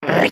shulker ambient; entity; Minecraft

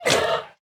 bite, mob, panda; Minecraft

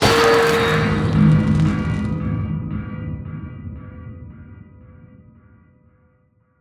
trident thunder; Minecraft

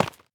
break, tuff; Minecraft

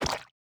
ink sac; Minecraft

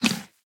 mooshroom eat; mob; Minecraft

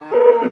cow hurt; mob

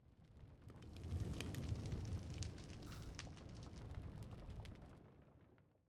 smoker; Minecraft

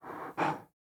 panda pant; Minecraft

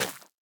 nether wart, plant; Minecraft

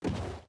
paddle land, boat; entity; Minecraft

